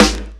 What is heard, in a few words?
Misc Snare; Mo' Phatt; Digital Sound Factory Mo' Phatt